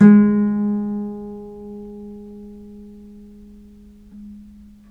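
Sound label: VIOLE PIZZICATO G#3, Historical Instruments; USB Soundscan vol.23; AKAI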